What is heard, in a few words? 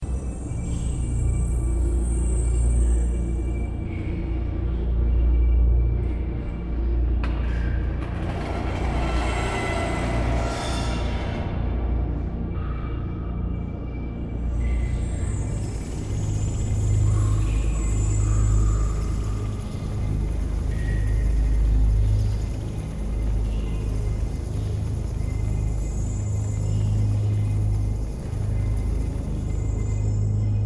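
Dwarven Ambience - Mine; shieldwall chronicles swords of the north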